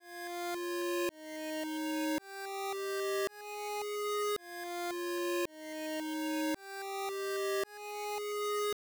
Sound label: 8bit